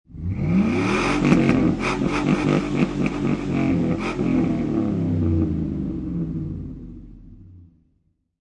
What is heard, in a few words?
Truck 5.3 Liter Rev Multiple Exhaust Perspective; Auto, truck, motor. 5.3 Liter Truck / Lorry. Rev engine multiple times, pumping the gas pedal. Exhaust pers; Car Sound Effects